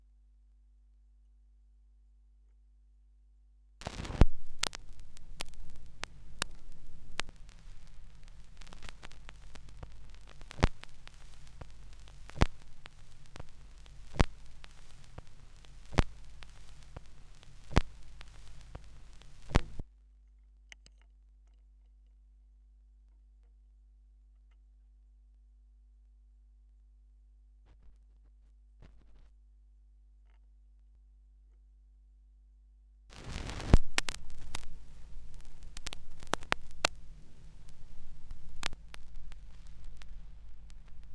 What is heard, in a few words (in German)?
schallplattengeraeusche; rauschpartikel